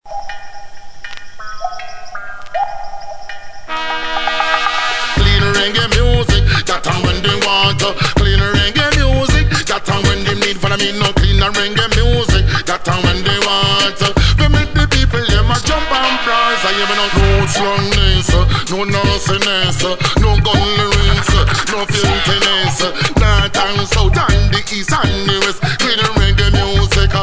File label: reggae music